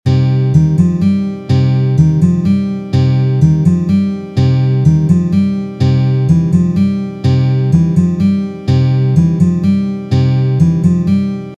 Intro Slow Tempo, guitar

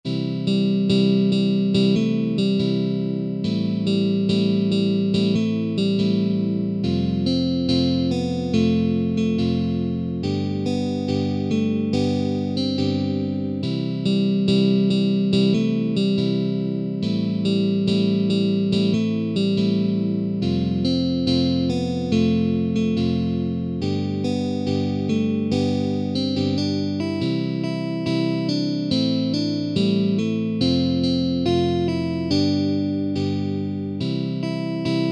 Verse Slow Tempo, guitar

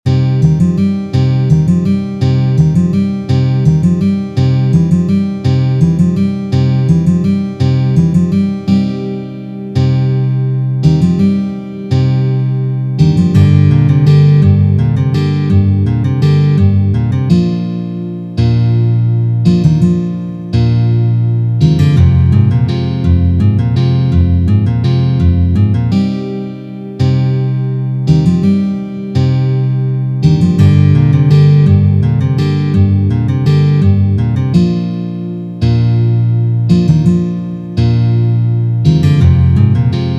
Fast Tempo, guitar

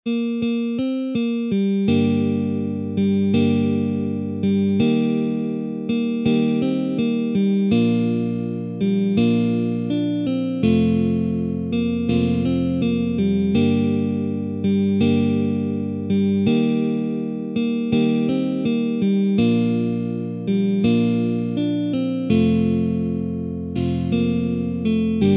Chorus Slow Tempo

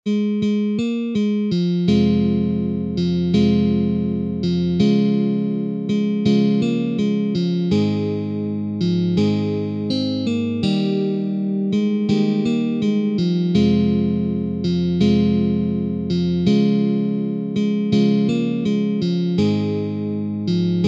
Chorus Slow Tempo

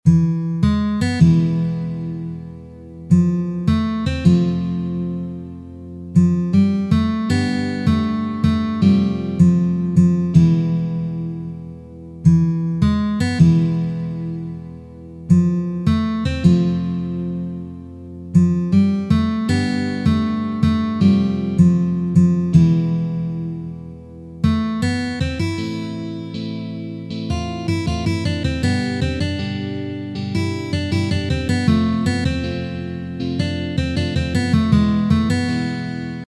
Verse Slow Tempo

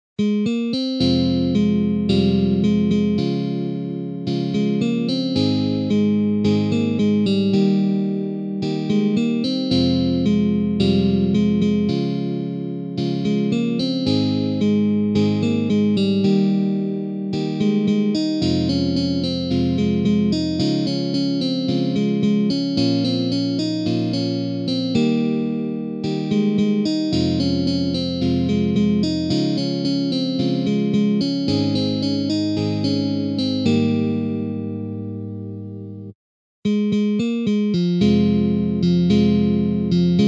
Fast Tempo, guitar

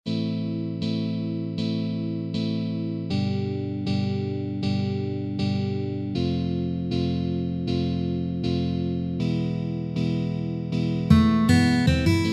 Intro Slow Tempo, guitar

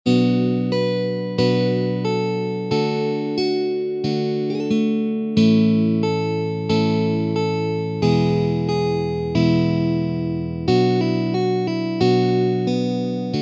Slow Tempo

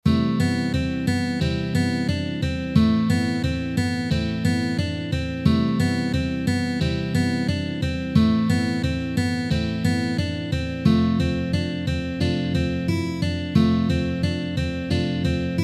Verse Slow Tempo

